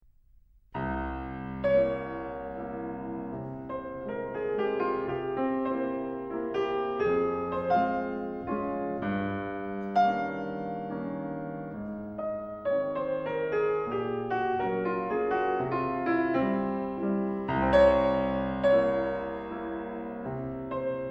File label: Mazurka in F minor Op. 63 No. 2; Frederic Francois Chopin